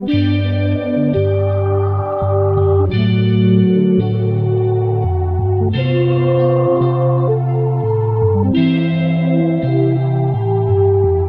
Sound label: buttery loop; Roogoo; Xbox 360